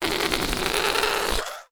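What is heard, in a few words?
Balloon deflate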